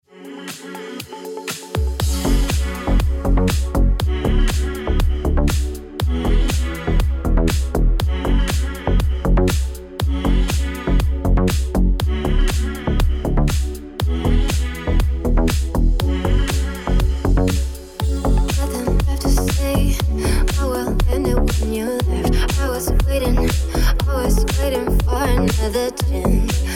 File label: Ringtone